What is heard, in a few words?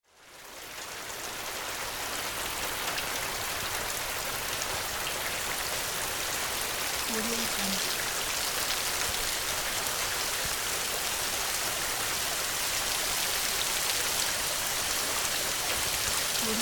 rain audio